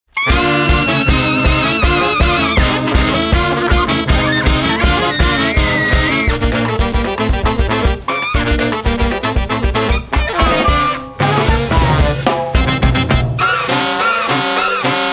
Zurna Taksim

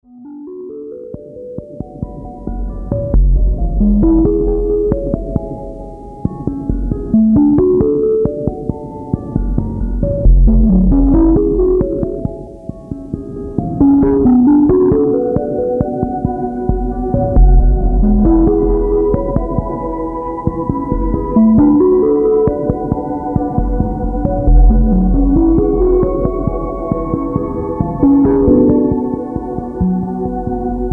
Modem (135 BPM)

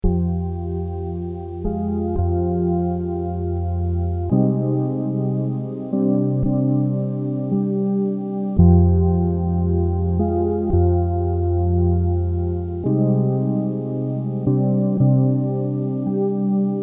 112.380 BPM

